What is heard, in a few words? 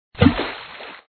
sploosh; shark